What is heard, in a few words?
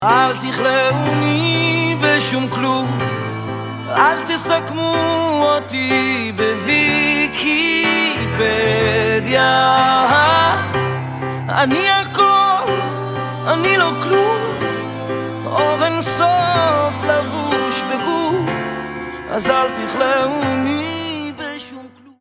Unknown Ringtone; Mobiles24